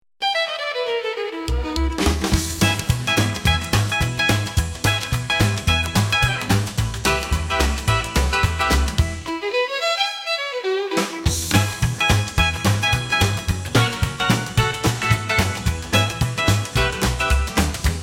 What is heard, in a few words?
rusty cadillacs, rockabilly